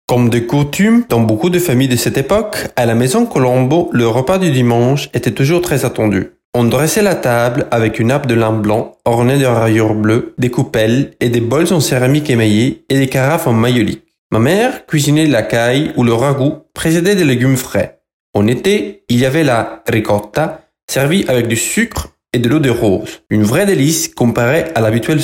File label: Piano